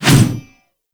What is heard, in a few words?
Mace Whoosh; Warframe